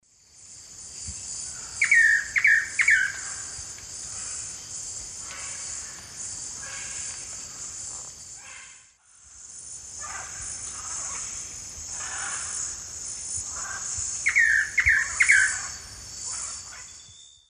Chiroxiphia pareola, song; S. America; Eastern Lowlands

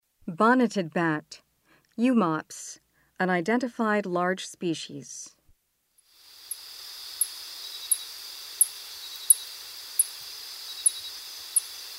Bonneted Bat; S. America

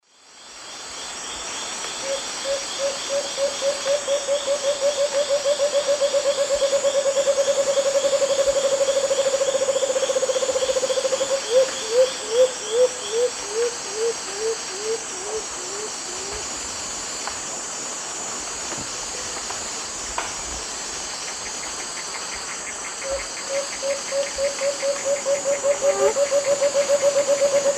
Chamaeza nobilis, song; S. America; Eastern Lowlands

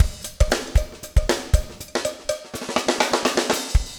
breakout 120, 120bpm loops

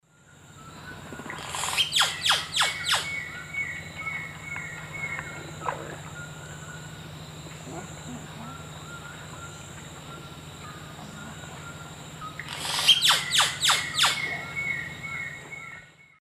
Cacicus cela, calls; S. America; Eastern Lowlands

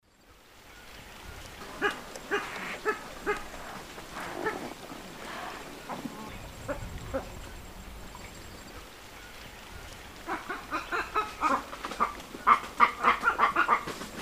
Cochlearius cochlearius, calls; S. America; Eastern Lowlands

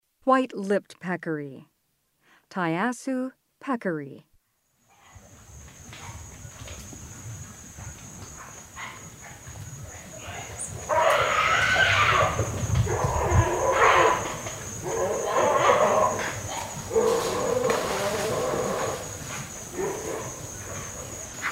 White-lipped peccary, Mammals of the Rainforest; S. America

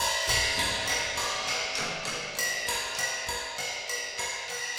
Kabuki Metal, Rhythmic Atmospheres; Ambient Cinematic

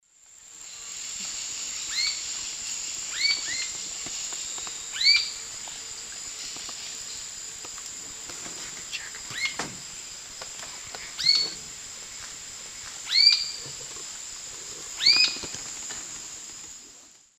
Galbula tombacea, calls; S. America; Eastern Lowlands

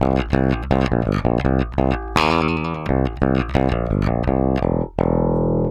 Bass C Funk

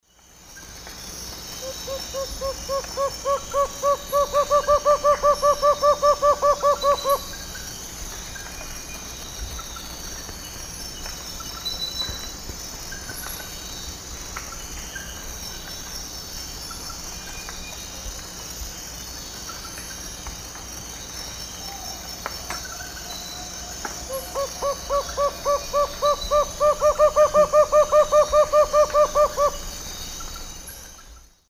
Megascops watsonii, alternate song; S. America; Eastern Lowlands

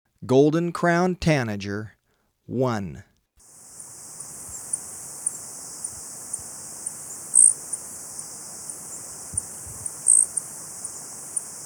Golden-Crowned Tanager; S. America

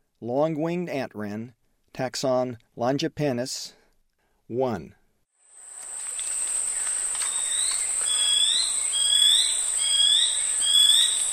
Long-winged Antwren (nom. race); S. America; Eastern Lowlands

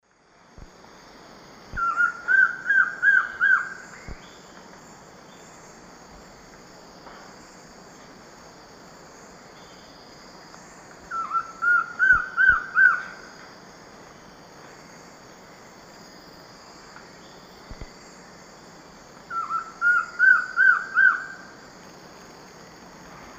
Trogon collaris, song; S. America; Eastern Lowlands

